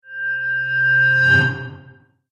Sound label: Riser Bell, Bell Sound Effects; Musical